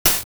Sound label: Snare, synth fx; Concussion; X-Static Goldmine